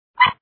Sound Exit; Meeblings

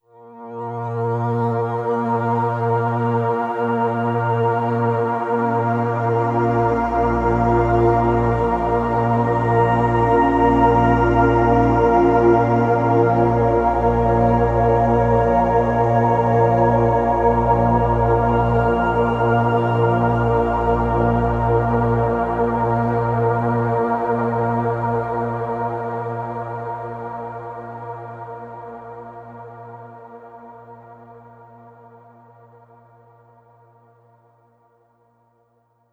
Drone

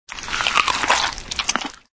Eat Sound

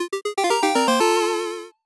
Open Chest; Curse Crackers For Whom the Belle Toils

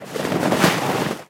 Snowball; Talking Tom Gold Run

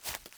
foliage step

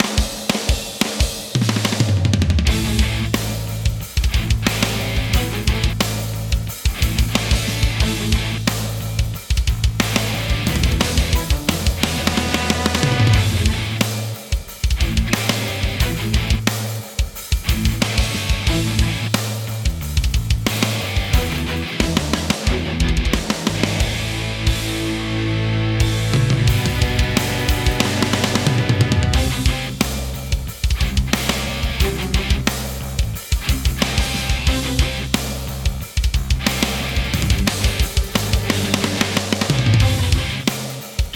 Combat Theme TENSION Without Solos

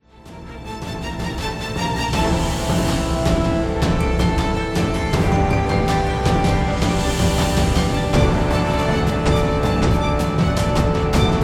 BGM Trailer; hidden dragon legend

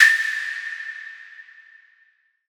Action Enter (Spacy); sound ex machina

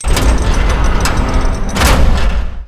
door; Shin Megami Tensei IV Apocalypse; 3DS

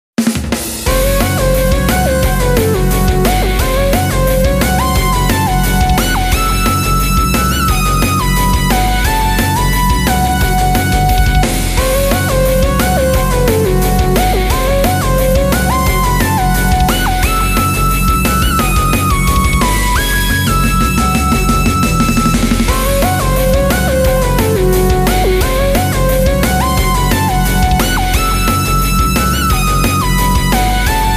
Music Success event

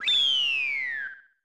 Goal!; Super Mario Kart

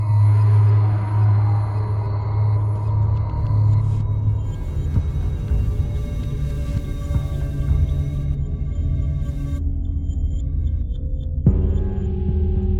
Explore Drone

